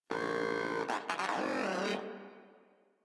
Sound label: Moa Aggressive Vocal Longing; Warframe